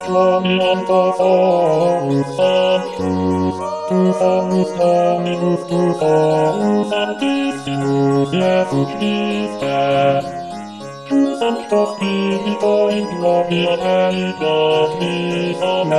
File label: quoniam basse